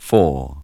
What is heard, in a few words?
VOX GENERAL; AMG Megabass REMIX! VOL-2